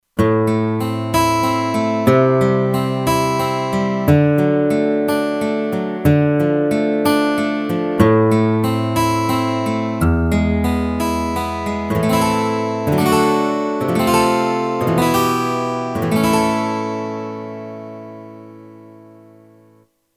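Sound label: Steel String Guitar, Kurzweil K2500X; Factory Programs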